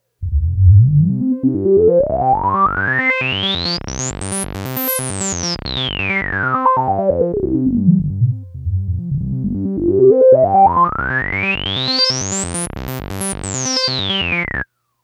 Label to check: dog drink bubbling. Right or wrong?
Wrong